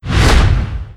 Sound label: swing